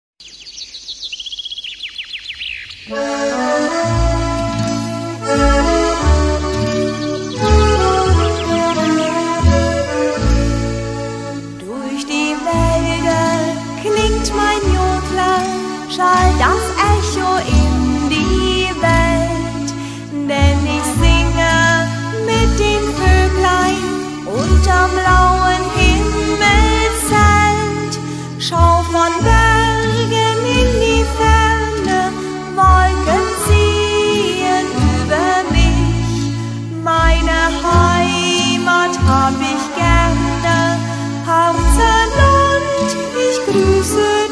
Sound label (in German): Durch die Wälder klingt mein Jodler, Jodler; musikalische harzwanderung